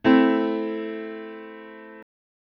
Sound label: Am lower, chords